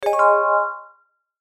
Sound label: chime bright harmony; Multimedia; Chimes; Blastwave